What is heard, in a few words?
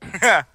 ha ha ha, ooh ahh; Voice